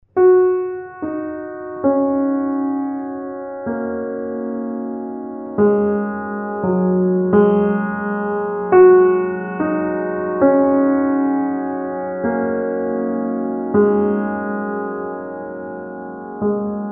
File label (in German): Glockenspiel